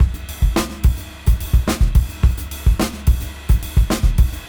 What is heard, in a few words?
Junky Funk 107 BPM